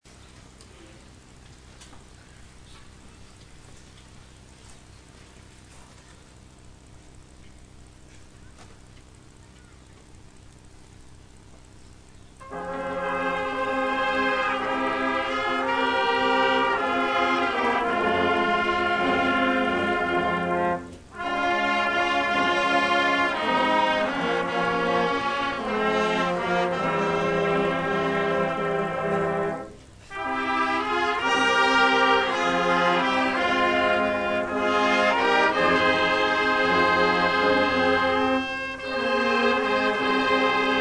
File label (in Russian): оркестр; Похороны М.А.Горянина